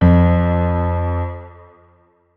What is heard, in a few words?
notes, keyboard piano; Hideout Furniture